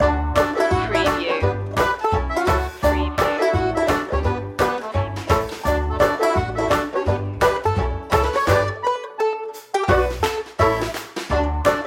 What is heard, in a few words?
party barn banjo loop